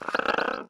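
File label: peace idle; LUNKER; Mob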